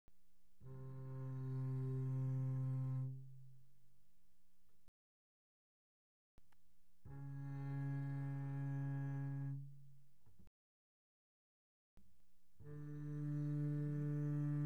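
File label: Bass arco pianissimo sul A C3 A3, doublebass; Strings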